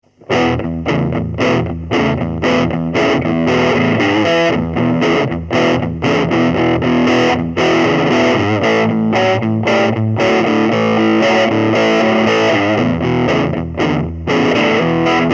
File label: el guit BLUES; el guitar pakke les; Maestro